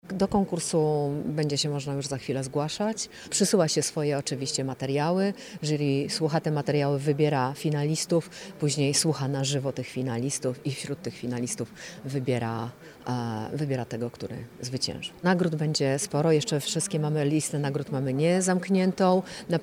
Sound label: jazz; 60 edycja festiwalu jazz nad odra